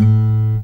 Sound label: ACSSFTFNGR, Guitar